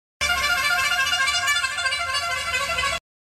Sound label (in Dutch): claxon